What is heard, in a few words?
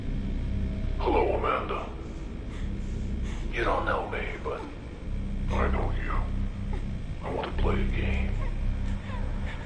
saw